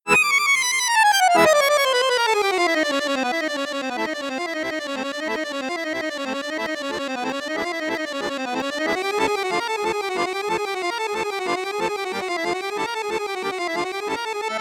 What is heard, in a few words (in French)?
registre flute juste piccolo, accordeon; saltarelle; registre flute boite + piccolo